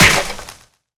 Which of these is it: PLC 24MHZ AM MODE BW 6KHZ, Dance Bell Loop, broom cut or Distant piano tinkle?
broom cut